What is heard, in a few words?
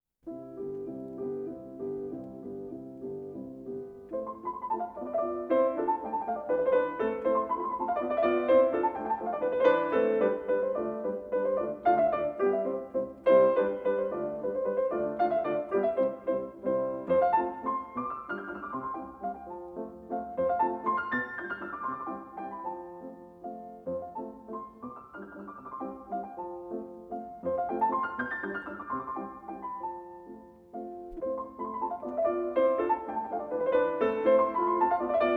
Mazurka in C major, Piano